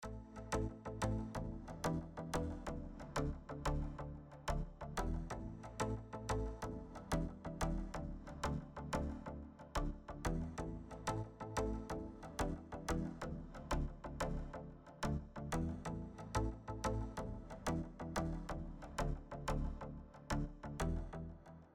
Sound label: music